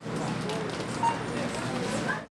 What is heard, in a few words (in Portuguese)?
Bip de Caixa de Supermercado; maquinas; colecao sonora do cerrado